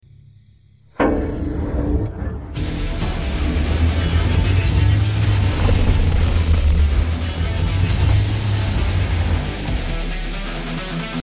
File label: no vocals